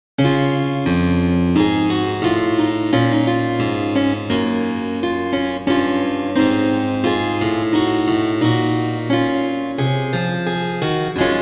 kontrapunktstudie; auf dem markt

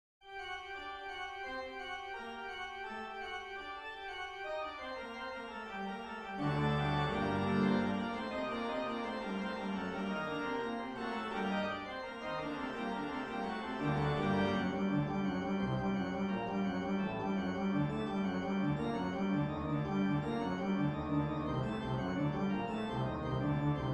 praeludium orgel moll; bruhns nicolaus

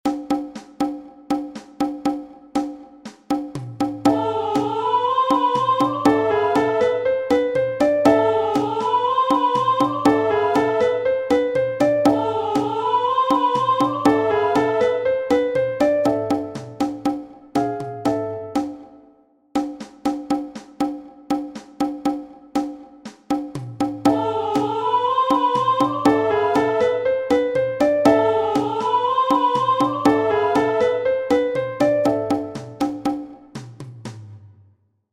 klingelton intro

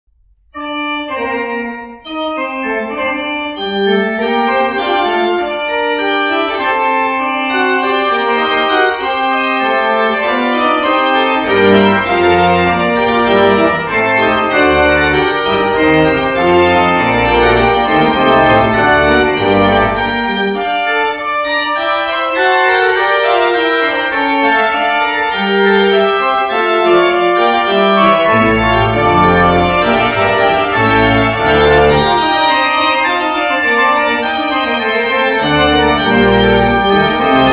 fuge moll die orgel; Wilhelm Friedemann Bach

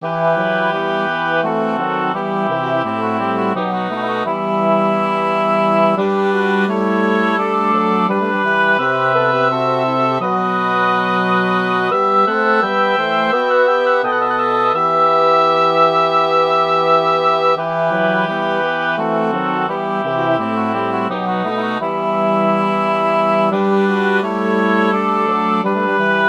unter deinen schirmen for double reed quintet; Johann Sebastian Bach

